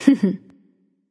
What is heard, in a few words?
hehheh; smite; pele